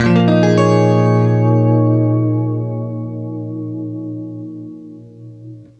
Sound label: CLEAN A#M9, Guitar; East West; Steve Stevens Guitar Samples Collection